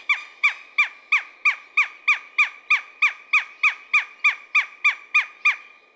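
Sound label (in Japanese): ootaka call, northern goshawk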